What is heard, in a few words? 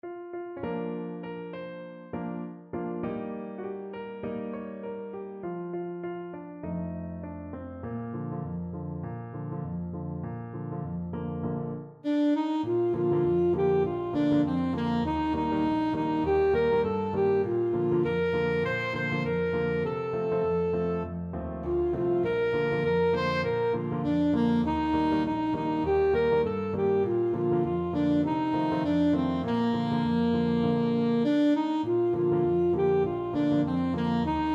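alto saxophone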